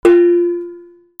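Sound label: percussion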